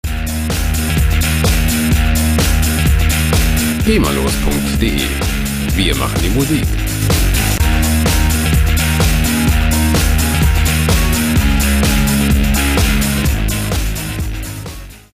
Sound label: punk